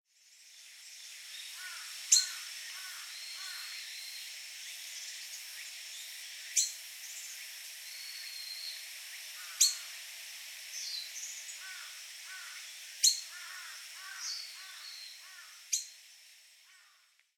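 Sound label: Forest Wagtail, nagasaki; iwamisekirei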